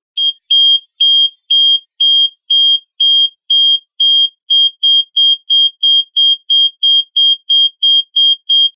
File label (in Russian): Непрерывный писк